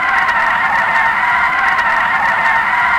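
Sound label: tires squeal loop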